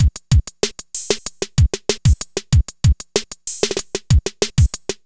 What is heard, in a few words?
kit; Sound Effect Library